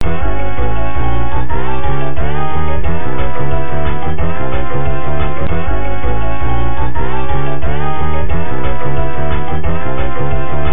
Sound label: Intense BGM; pac eight arcade gamerip 1996